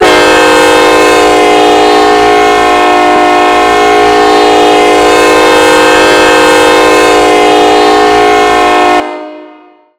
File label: k5lla, horn; run 8 v3 horn pack